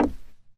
wood footsteps; zps; Foley

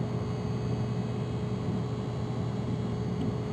Computer, Ambient; zps

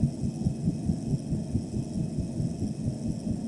Ceiling Fan; zps; Ambient; Fans

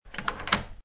unlock; zps

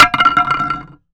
Bat Collision; zps; Foley

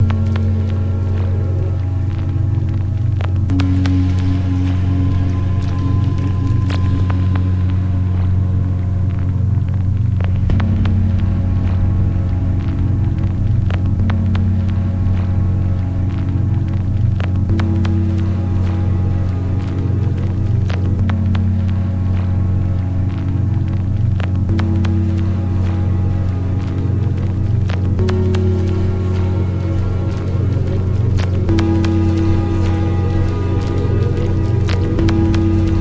music; zps

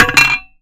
Pipe Collision; zps; Foley

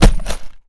IED Drop; zps; Weapons; Explosives

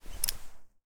357 Holster, Magnum; zps; Weapons; Firearms

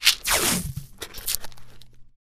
Health Pack; zps

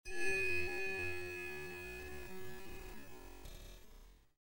metal scrape; zps; Ambient

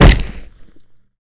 Fall Hard, Impacts; zps

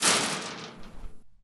chainlink, Footsteps; zps; Foley

